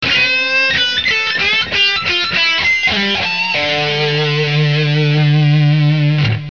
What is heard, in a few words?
129 lead